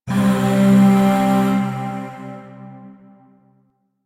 Shawzin Chromatic Chord A; Chords; Warframe; Zariman; Void

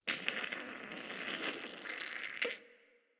Infested Wheeze Close; Ambience; DE; Warframe; Infested Eris

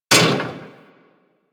Bullet Impact Debris Pipe

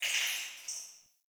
Cave Ocean Creature Close; Midrath Island

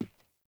Grunt Boot Walk Wood; Characters; Enemy; Melee Grunt; Footsteps; Boots; Sinecure

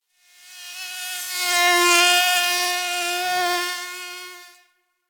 Forest Close Insect Buzz Close; Ambience; Warframe; Grineer Forest

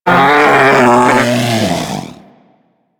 Predator Vocal Death; Warframe; Hunting Critters

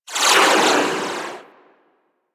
Swarm Missile Projectile By; Weapons; Warframe; Sentinel; Helstrum

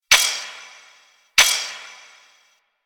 Lantern Shine Ticking Loop; Warframe; Emissary Season Two

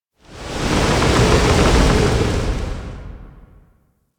Tower Trunk Movement; Ambience; Warframe; Quests; The New War; Cetus; Unum Tower

